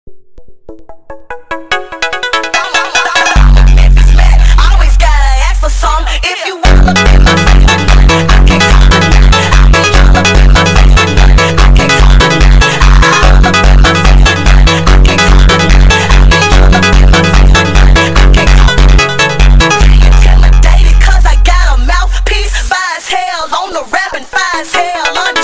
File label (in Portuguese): Montagem sped Up